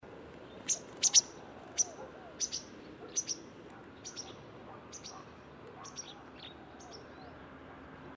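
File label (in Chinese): 白鶺鴒起飛鳴, Yushan National Park; 鶺鴒科/留鳥、冬候鳥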